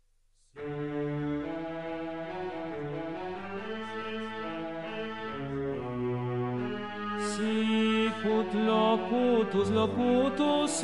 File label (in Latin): Tenor; Sicut locutus est